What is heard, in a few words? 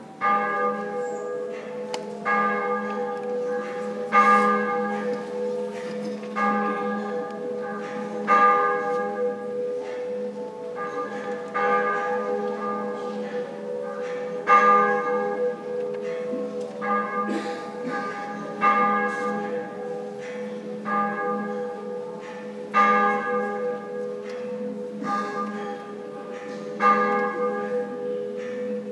chapel bell sound; trinity college kandy